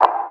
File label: swish